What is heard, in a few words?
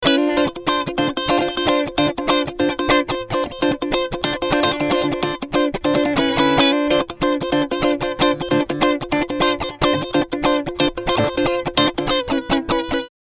Tierra Audio Gravity VCA guit dry